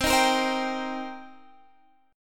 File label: Eb 6th C, guitar